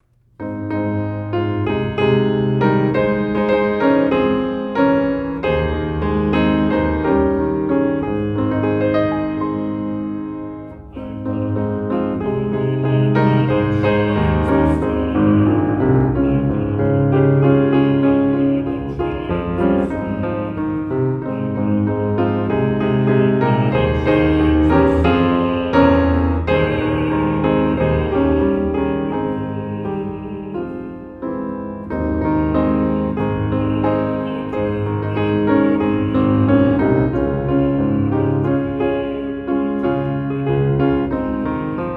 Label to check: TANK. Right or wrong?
Wrong